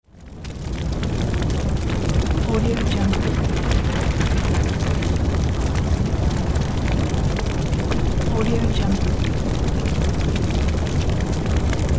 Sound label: wood fire flame sounds